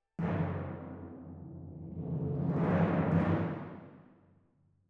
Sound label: Timp